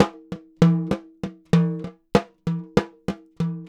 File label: African Sakara, African Percussion; World